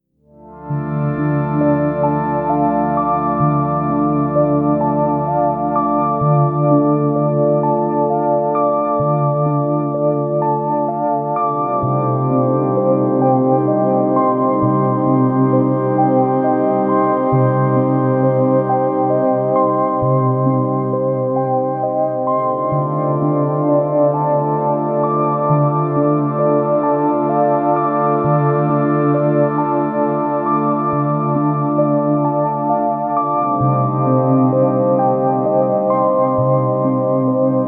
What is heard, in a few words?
JupiterX Juno106 Soft Texture